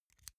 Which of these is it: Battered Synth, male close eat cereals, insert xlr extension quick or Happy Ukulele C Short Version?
insert xlr extension quick